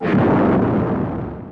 Launch; Peripheral Empires

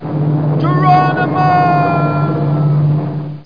jump; MPG-Net (USA); garden

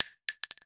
rifle shell hard, weapons foley; Spearhead Demo; MacFormat 2003 June

